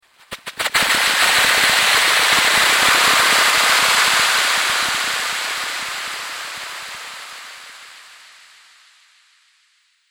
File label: Laser Spark And Splash, sci-fi laser sound effect; Laser Future